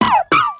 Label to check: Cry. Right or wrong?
Right